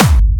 Hardstyle

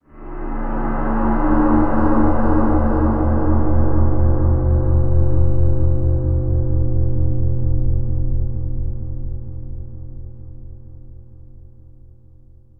D Minor Pad

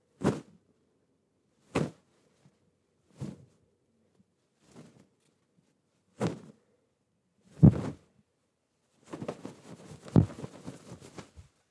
Cloth Shuffling And Waving

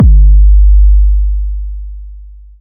Kick

